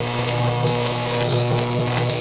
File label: buzz